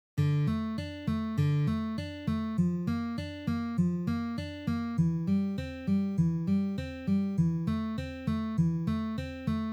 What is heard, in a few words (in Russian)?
perebor